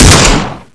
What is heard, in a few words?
combat